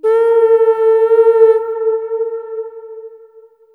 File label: HRN A#4, AMB HRNFX, Heart of Africa